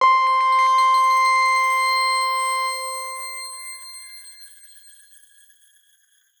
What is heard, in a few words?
Grain C5 ff; healing soundscapes; HSS Ableton Pack1; X Grain